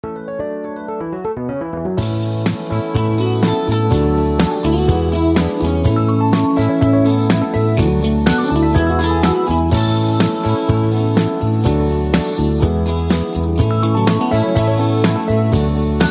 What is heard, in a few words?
Instrumental No Melody